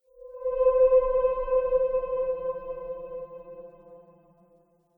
Spaceship Faint Frequency, spacecraft sound effects